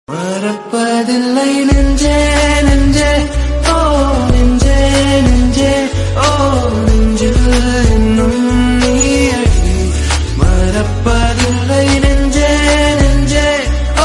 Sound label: Bgm Tamil Ringtone; Oh My Kadavule